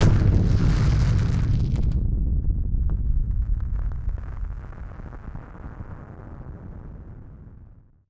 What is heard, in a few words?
Firework FX; musicradar; bomb factory